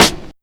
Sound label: raw acoustic snare b key; Duration 0:01; Samplerate 44100 Hz; Channels Mono